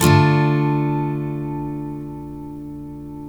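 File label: steel D minor up, CHORDS STEEL, Guitars; Spectrasonics; Hans Zimmer Guitars VOL-1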